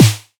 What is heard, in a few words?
damped snare A key